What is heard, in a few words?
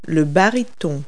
baritone; Multi-Lingual Talking Picture Dictionary